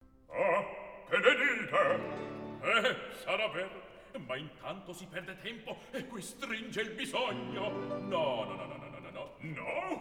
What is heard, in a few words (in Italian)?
Recitativo; Gioacchino Rossini; Act I; Che ne dite maestro